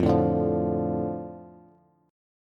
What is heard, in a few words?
F 6th F down Bass